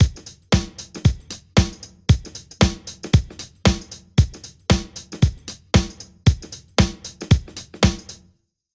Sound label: drum comp